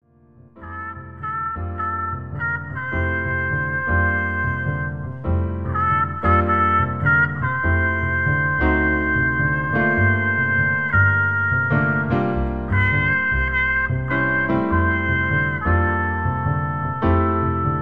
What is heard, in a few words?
Jazz Trio; Play Music Swiss